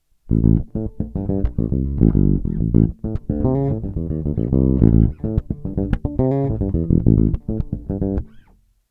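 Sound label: Bridge pickup, basso elettrico artigianale; Questo basso a quattro corde, ispirato ai canoni classici del Jazz Bass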